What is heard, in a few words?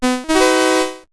level up; fargoal